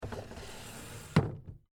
Drawer Close, Foley, Drawer, Close, Antique, Wood, Dresser, Small; Drawer Sound Effects